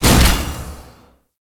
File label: Vault Door Open End; Spy; Ambience; Gameplay; Warframe; Corpus Gas City; Gameplay Remaster